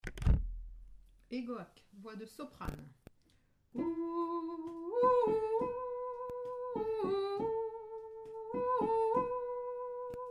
soprano

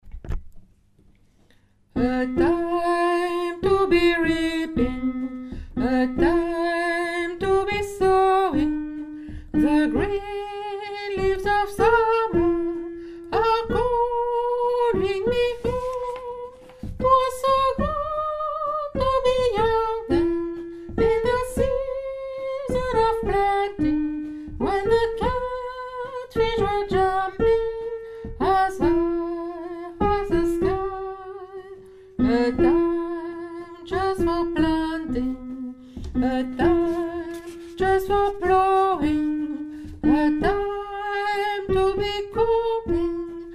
soprano, chorale; the green leaves

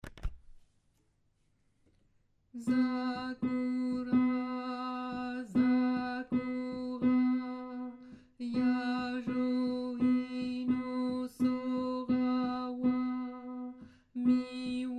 sakura alto

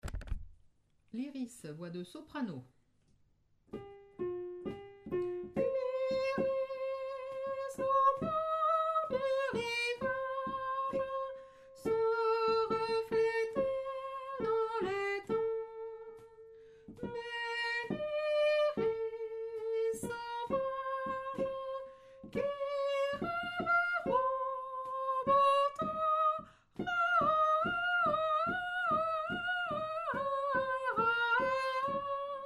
liris soprano

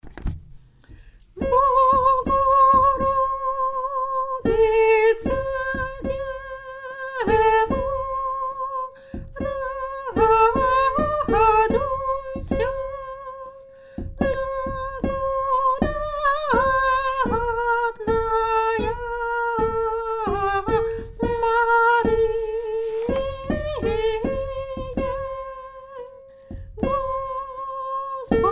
bogoroditse devo tenor

